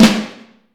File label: Vintage Snare Drum; Q Up Arts; Johnny.C's Vintage Snare Drum; 5X14